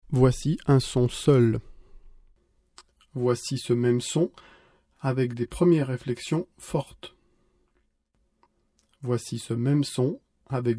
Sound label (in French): Filtrage